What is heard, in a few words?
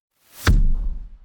heavy step